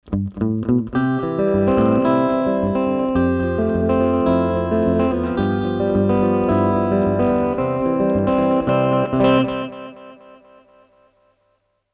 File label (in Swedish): Gitarr med slapback